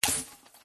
negative robotic affirm hybrid mobile collect special power up buff; epic stock media